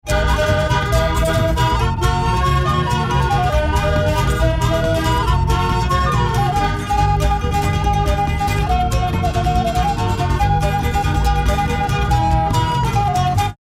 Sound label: Trad. Folk Pitch